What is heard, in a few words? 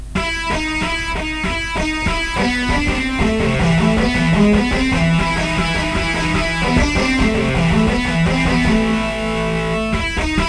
e guitar test